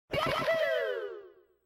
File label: waho; cave